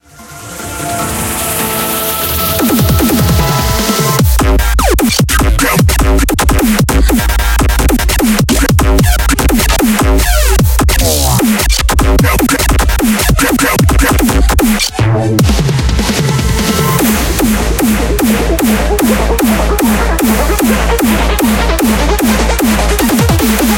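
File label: background music crossfade; Invaxion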